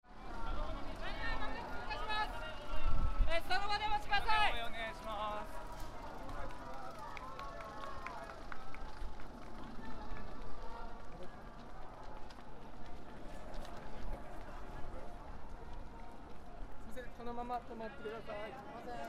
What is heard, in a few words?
shinhama olympic torch relay